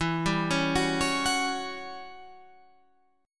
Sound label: E Minor 6th Add 9th chord